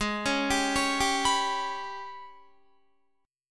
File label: Ab Minor Major 7th Double Flat 5th, down, Guitar; arpeggiated; AbmM7bb5